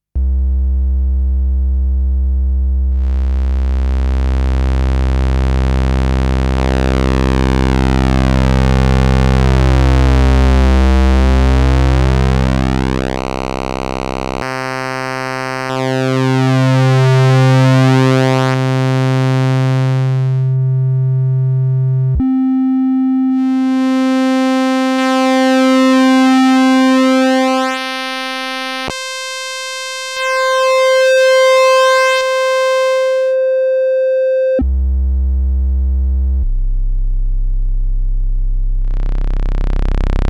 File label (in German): Oszillatoren Sub 37